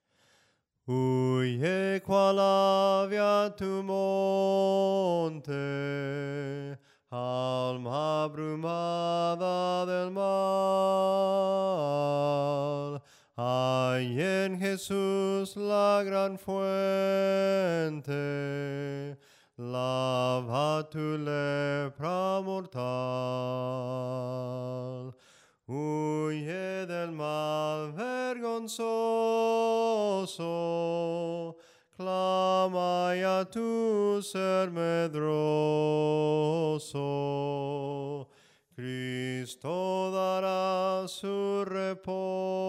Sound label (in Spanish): SOPRANO CANTADO; cantos del camino